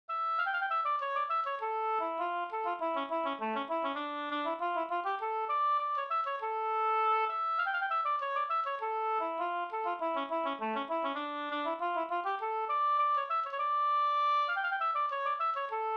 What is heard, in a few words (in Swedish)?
polska Bingsjopolska; e Mahlen e Pekkos Helmer